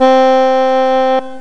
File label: soprano sax; Software Explosion 2 - Virtual Computer Pets; yamaha xg; reed